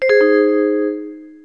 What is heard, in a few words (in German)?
schlange